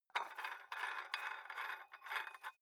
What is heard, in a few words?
dumbbell open nut thread, fitnessstudio